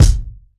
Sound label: reverb kick sample F sharp key; kick drum samples; one shots